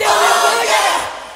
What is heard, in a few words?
"oh yeah" crowd sung; ooh ahh